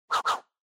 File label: Motion Swish Phrase; モーション スイング; フレーズ